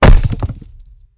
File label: battle catapult